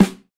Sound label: snare samples, Rock